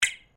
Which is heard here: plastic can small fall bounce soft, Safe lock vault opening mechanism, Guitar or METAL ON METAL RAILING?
METAL ON METAL RAILING